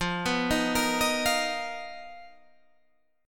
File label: B Major 7th Flat 5th F, chord shape